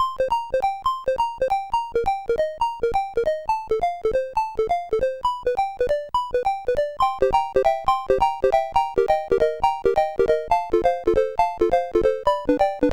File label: Renegade for Life, CSG8580R5; sid